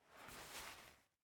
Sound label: Remove Clock; Game rip; MiSide